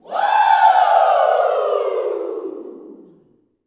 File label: "WOO", Gospel, YELL SHOUT; Spectrasonics; Vocal Planet Vol.1 Gospel; akai